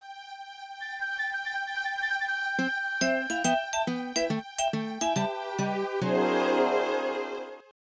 Music Prologue Out for a Walk; NSMB

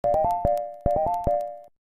Level Complete Theme; DK Arcade

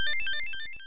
Checkpoint; SML2

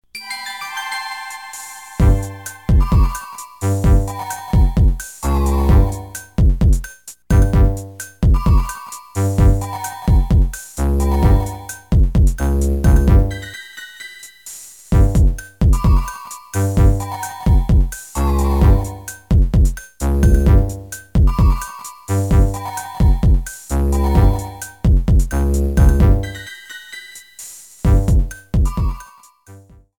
Illusory Rhythm; Mario Party DS

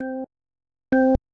Audio Sample Organ

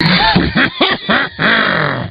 evil laugh; Wizpig

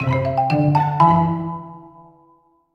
New Item!

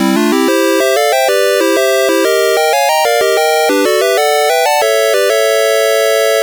The jingle; Bonus Stage Theme

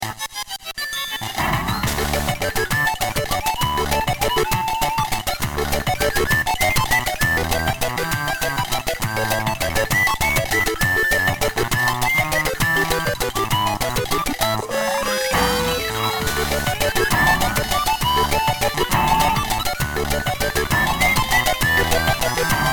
Mini-Game Theme

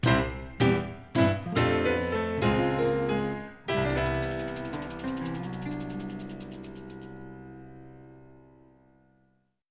Game Over Arrangement; SMB

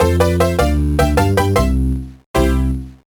Course Clear; SMA4

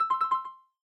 System Attention Pop-up; MK8

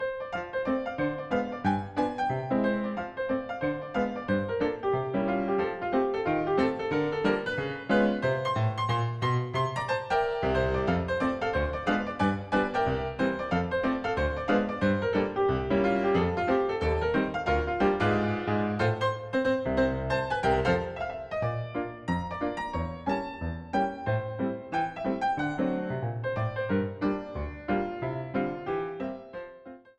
Main Theme (Ragtime)

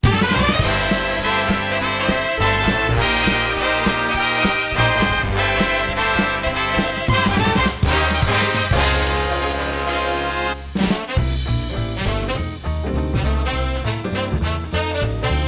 Arrangement

